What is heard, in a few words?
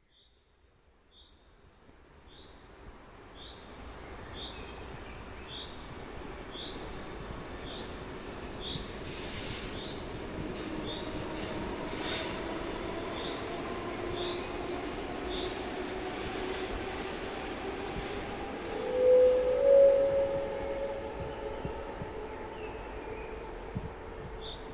Spring Waves; cross-borders recording by Judit Emese Konopas Aloïs Yang